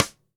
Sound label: DW Green Maple Cross Stick; Sonic Foundry (Sony Creative Software); Vital Drums